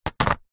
household telephone landline plastic receiver put down